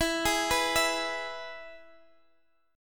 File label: E Major guitar chord, Open G